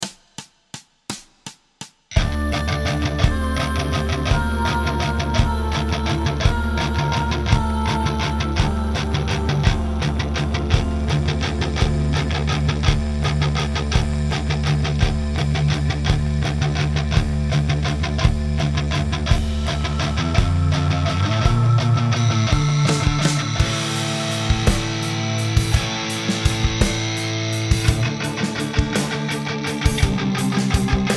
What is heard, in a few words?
Minus Guitar, Performance Backing Track; Carol of the Bells